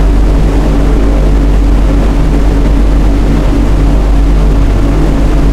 blenheim cockpit, engines; War Thunder

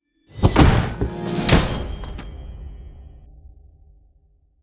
box prize; World of Tanks Blitz

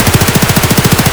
hk416 indoor distant loop; Escape from Tarkov

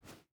footstep rubber boots sand jump start; Rust

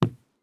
walk wood; Escape from Tarkov

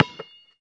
basketball dribble; Call of Duty Modern Warfare

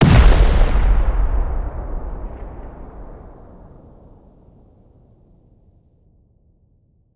c4 explosion; Rust

